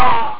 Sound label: ough; Amiga Soundtracker Sample Packs